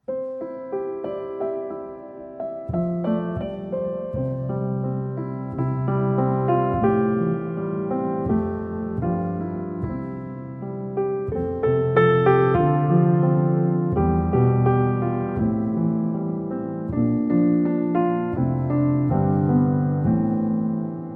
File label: piano